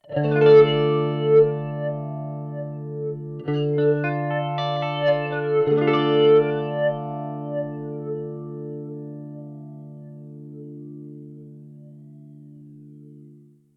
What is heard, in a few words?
TC Electronic 2290 P Flanger